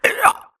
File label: grunt death neck; Titanfall 2